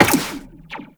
Step Zipline, Footsteps, Walk; Warframe; Characters; Tenno

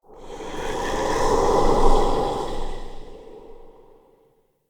Amb Corrupted Wind Gust; Ambience; Mendicant Area

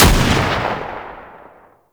Archer Shot; Warframe; Tau; Tau Dax; Weapons; Tau Dax Archer Gun; Sybaris

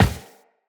Run Default, Footsteps; Tenno

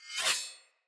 Sphere Dual Sword Accent Blade; Weapons; Player; Swords

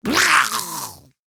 Spawn Grineer Ghoul; Grineer Ghoul Expired; Battle Barks

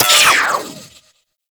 Cannon Mech; Warframe; Weapons; Archwing

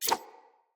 dagger whoosh; Sinecure Dagger

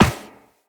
Walk Metal Perforated, Footsteps; Operator

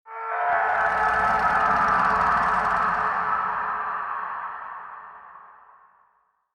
Distant Medium Howl; Warframe; Sacrifice; Umbra

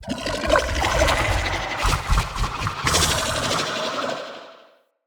Corrupted Slug Sub Boss Vocal Idle; Creatures

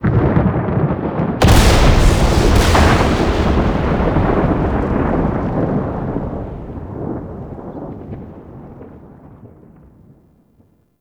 Sky Thunder Clap; Ambience; Warframe; Duviri; Murmur

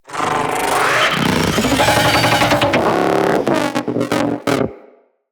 Jackal Stomp Scream, Machines

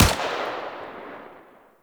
Shot, Shotgun; Warframe; Eidolon; Shotgun Lancer

